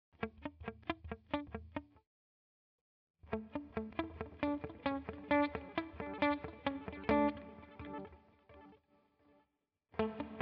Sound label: UAD DelVerb TapeModPlate